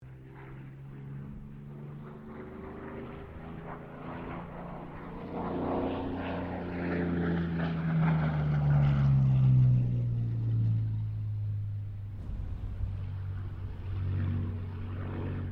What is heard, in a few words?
AIRCRAFT P-40 KITTYHAWK PASS DISTANT; P-40 Kittyhawk / Warhawk / Tomahawk; Airplanes; Vehicles